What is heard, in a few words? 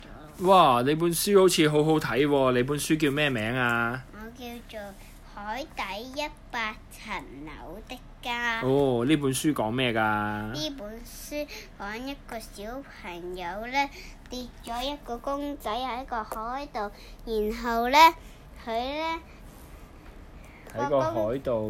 cym